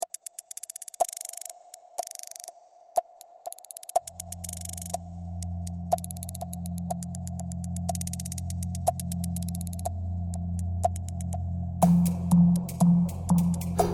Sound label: Hard Steps